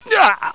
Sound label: pain